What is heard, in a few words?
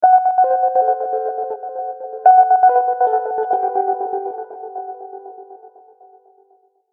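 Musical Element, Drum Snare March; Blastwave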